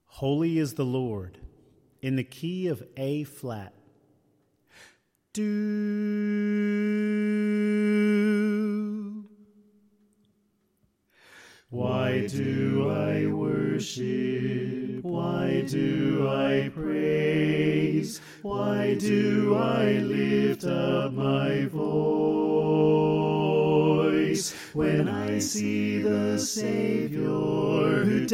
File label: Alto Left